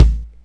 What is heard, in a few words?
Deep kick clean